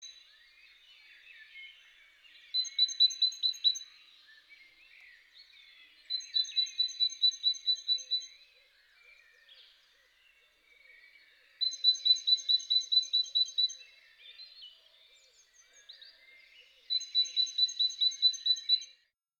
Koolmees

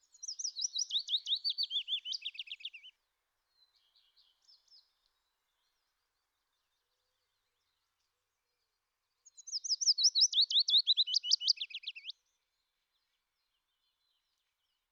fitis

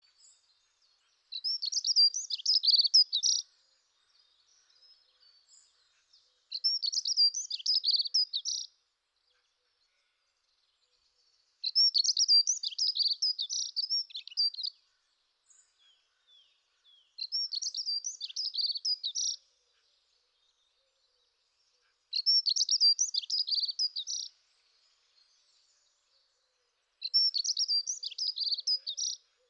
heggenmus; David Sluis

